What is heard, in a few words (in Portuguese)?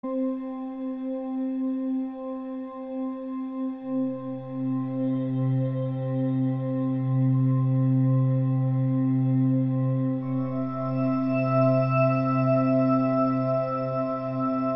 som abstrato